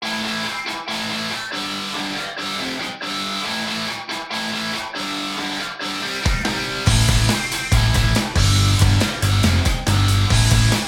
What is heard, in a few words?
japanese alt rock power trio; weeklybeats 2024